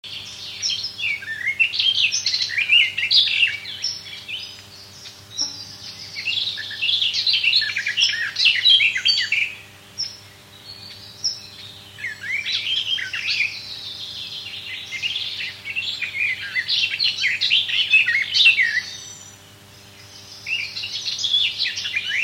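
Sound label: sadovaya slavka golos, garden warbler voice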